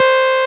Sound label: Synth Shot C